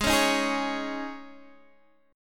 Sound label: G sharp Suspended 4th Guitar Drop D chord, down